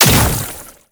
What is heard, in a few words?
Brood Projectile Impact; Warframe; Oraxia